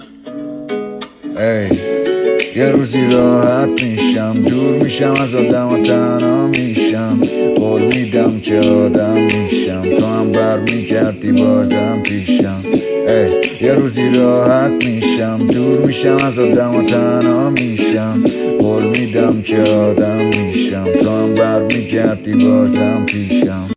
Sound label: guitar